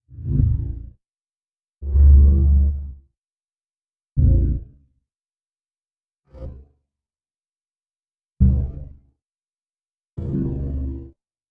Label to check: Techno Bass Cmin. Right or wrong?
Wrong